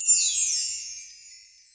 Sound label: chimes; Bells